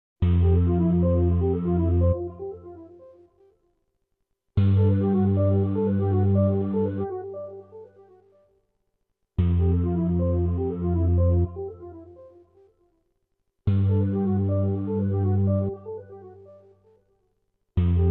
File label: Rhythm